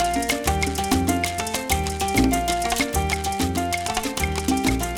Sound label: son montuno